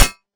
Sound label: whip crack; Character Actions